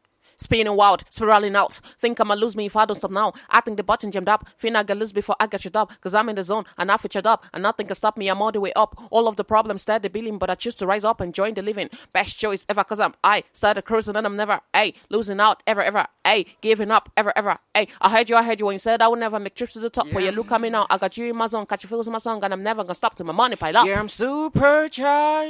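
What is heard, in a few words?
hip hop rap sing, 80, A flat minor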